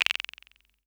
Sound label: SR95; Best Service; Dance Mega Drums